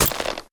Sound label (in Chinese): 修复狼甲声